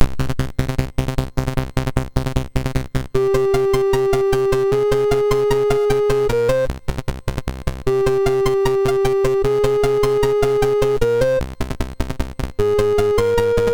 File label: sid MOS6581R3, GAMES; Die Hard